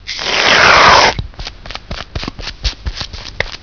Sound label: shave; Dictionary for Children